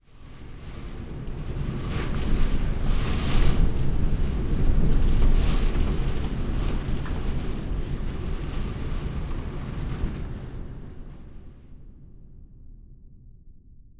rain

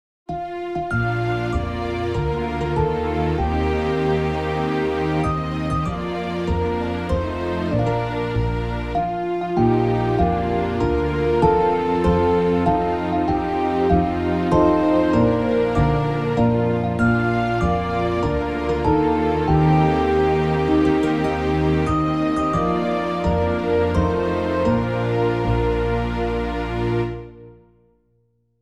happy

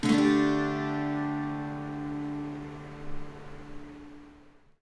Dsus4, guitar

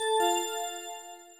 pin; SE

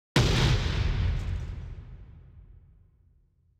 bomb

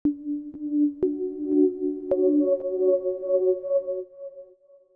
water

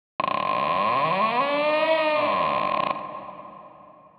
door

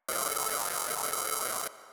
noises

